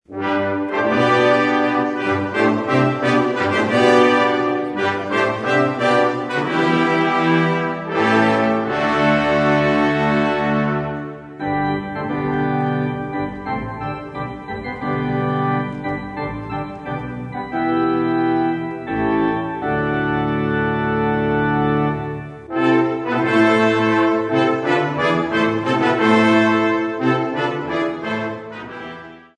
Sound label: Grand Choeur